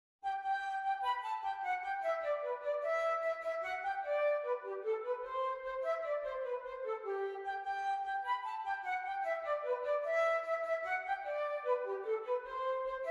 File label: music flute